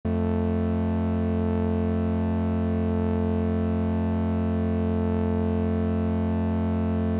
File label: Krischer M8 2 OSC